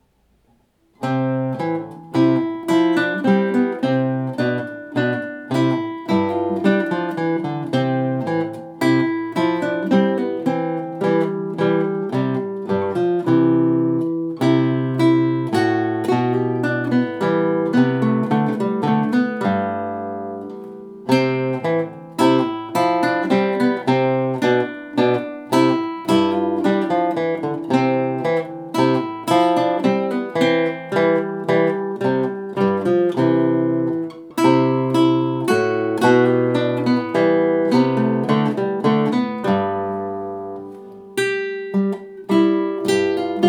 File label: Minuet, guitar